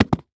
Foley Footstep Soft Sole Work Boots Jump On Cement